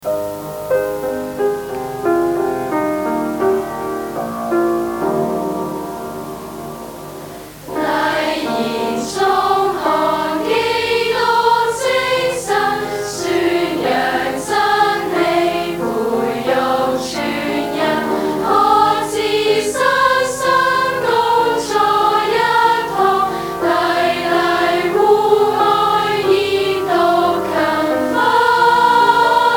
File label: School Song